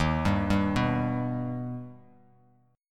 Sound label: Eb Augmented Eb, Bass Standard; arpeggiated; JGuitar